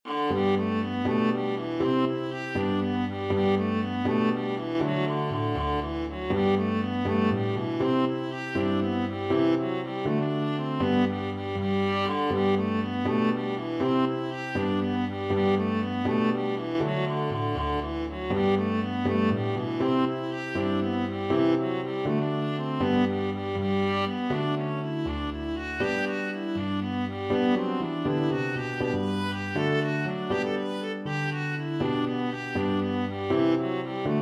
merry christmas, viola

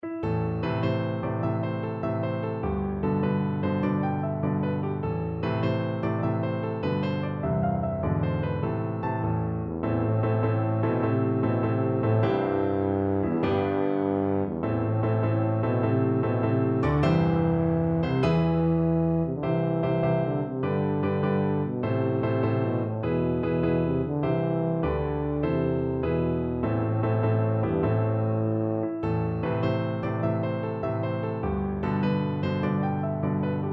johnny marching home, tuba

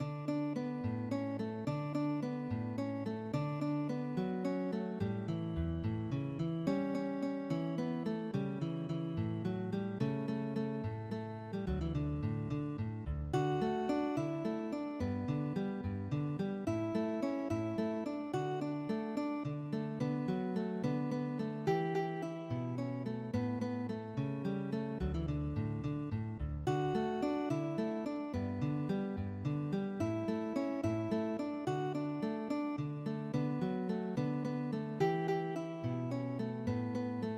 Cielito Lindo, guitar

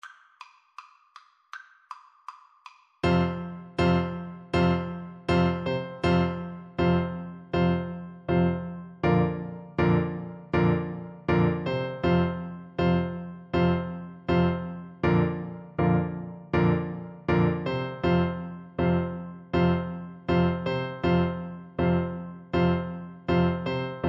tchaikovsky 1812, Bassoon

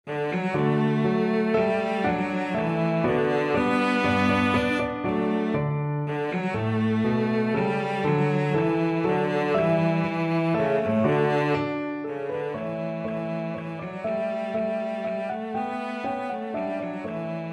German dance, cello; K605

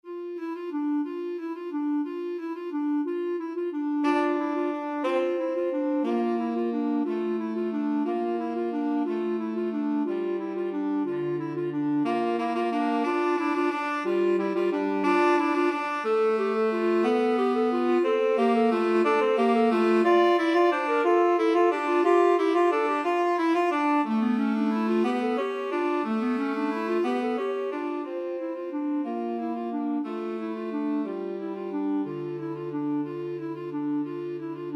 carol of the bells, clarinet tenor saxophone

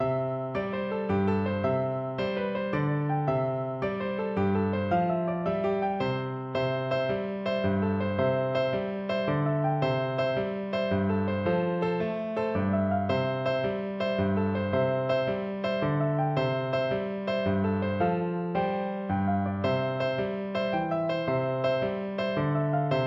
swaggering jig, Flute

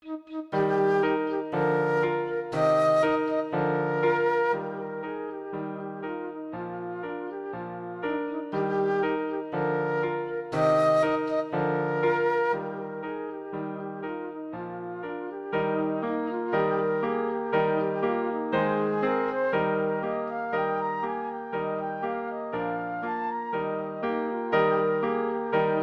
beethoven ecossaise, flute

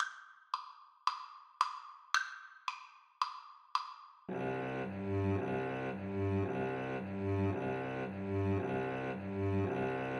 mountain king, Cello Duet; greig

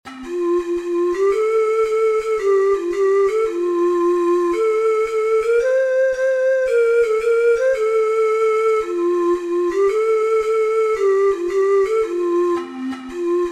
itsy bitsy, ocarina four hole

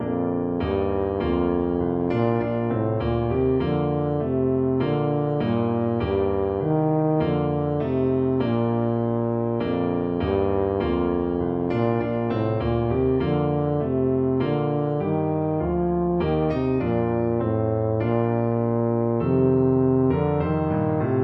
cwm rhondda, tuba

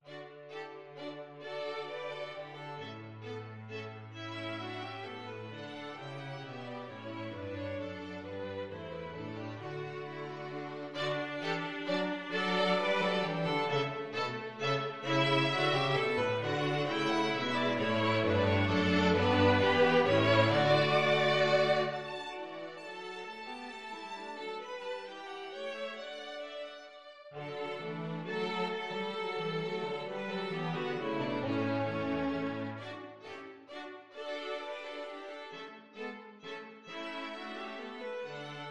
string quartet

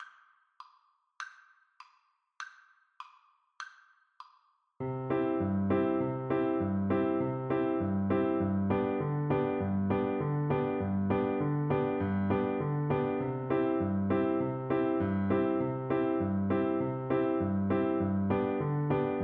horn, French Horn

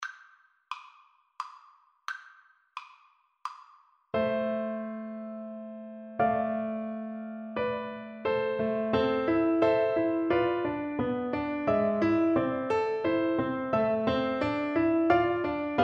Oboe

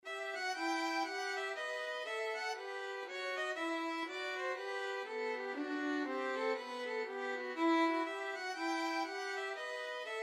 bouree violin trio; bach

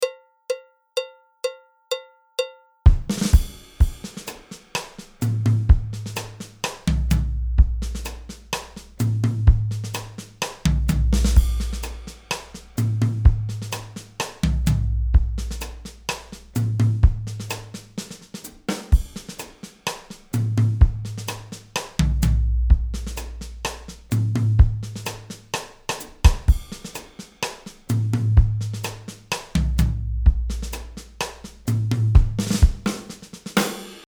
Rumba Cha Cha, drums

